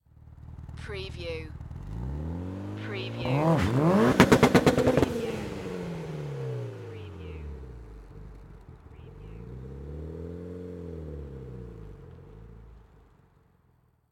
MITSUBISHI EVOLOUTION EXHAUST TAKEOFF

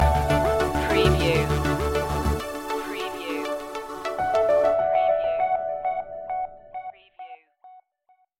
forward thinking stinger

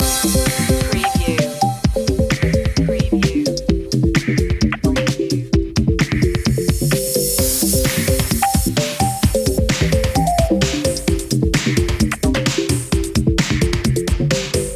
electro cool loop; media music now

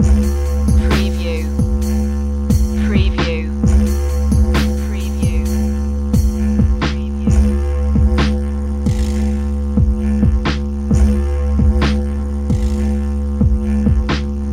didgeridoo loop

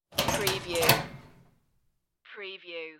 lock metal locker, the sound pack tree

